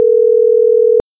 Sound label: outgoing Ringing; jitsi